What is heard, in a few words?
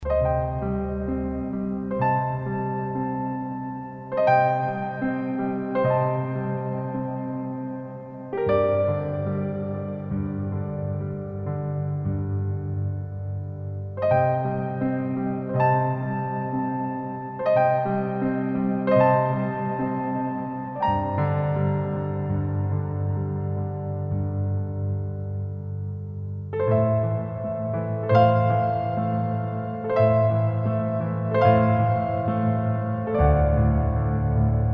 The Inspector Arrives Looping